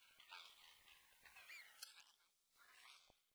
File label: Bedroom Whistle